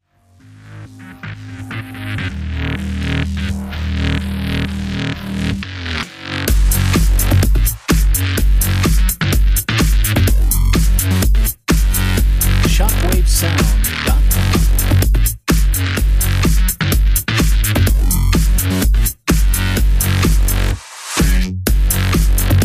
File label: Menu Music Modern; Pixel Gun 3D